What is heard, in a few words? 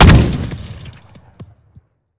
weap fire; gmod; weapons